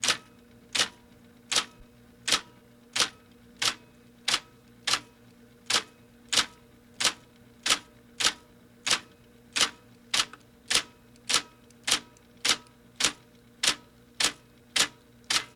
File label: E-Typewriter Type Slow; Home & Office; 1001 Sound Effects